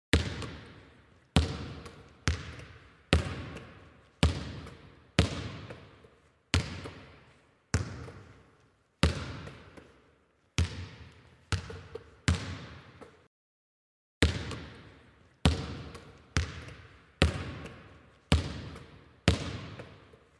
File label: Basketball Bounce